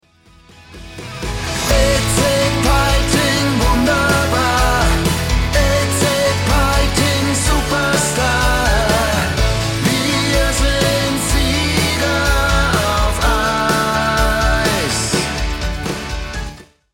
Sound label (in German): hymne